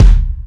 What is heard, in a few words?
drum hit clap; osu